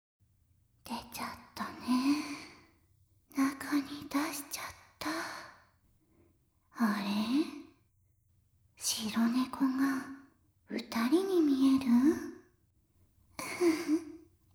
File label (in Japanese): 幻覚脳バグ耳舐め, ASMR